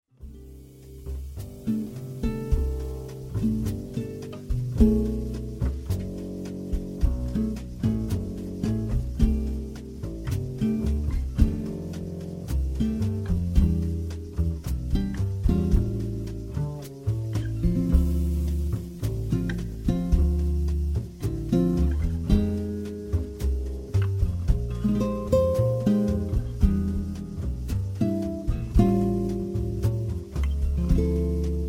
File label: meditation fragment, altosax